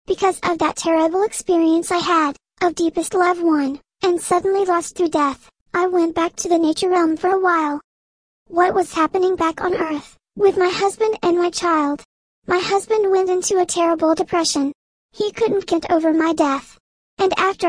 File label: nature spirit rate medium pitch x high